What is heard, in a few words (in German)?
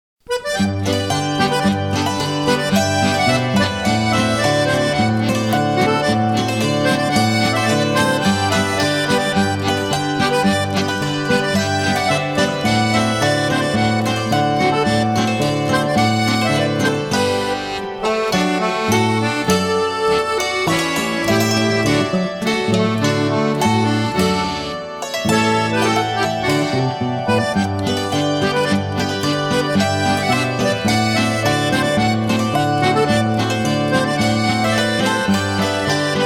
Schuetzen Polka